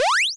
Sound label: win; DelphiArcadeGames; RocketShipDeltaStarter